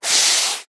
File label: office sponge brush single stroke long hard pressure on paper; zapsplat